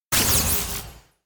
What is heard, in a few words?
elec qpyin electric; anemoi